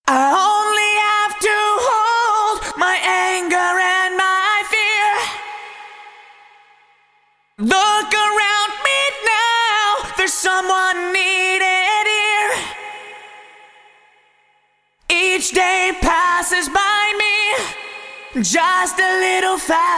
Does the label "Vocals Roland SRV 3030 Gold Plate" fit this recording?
Yes